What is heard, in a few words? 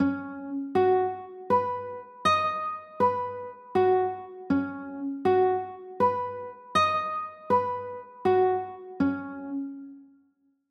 c sharp 6 9, chords; c sharp 11